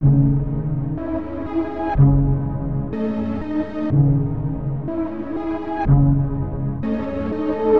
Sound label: cinematic hiphop Orchestral C#m 123 bpm